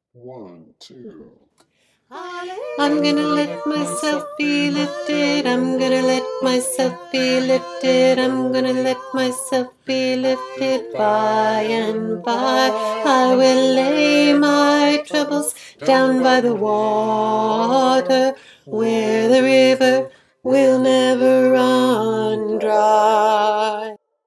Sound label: halleluja refrain in f tenor